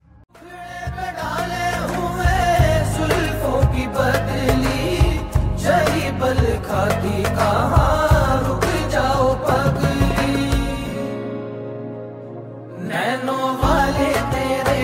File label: Ringtone